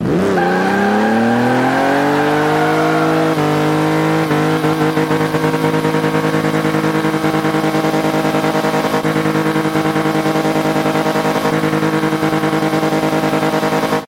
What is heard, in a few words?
rev, vehicles cars; austin healey; lwcars